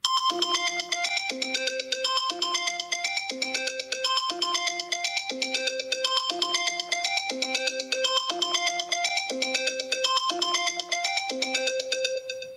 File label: iPhone 12 Circuit Ringtone ios 14